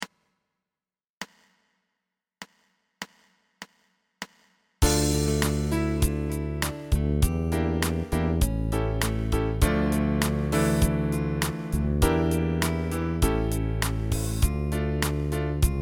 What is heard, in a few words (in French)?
accompagnement